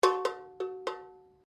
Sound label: Bullock Bell Forged Ringing, Old cowbells from 1800's; Condomine; Bells (Sound Effects:Musical)